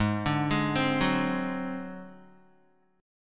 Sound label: Ab 7th Flat 5th down Guitar; Ab7b5; arpeggiated; 4E,4A,5D,5G,5C,6F; chordshape; JGuitar